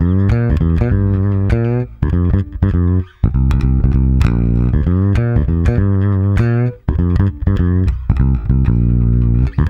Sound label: MEAN G, Bass; Spectrasonics; Bass Legends; MEANSWING